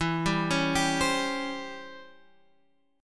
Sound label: C sharp Minor Major 7th Flat 5th, chord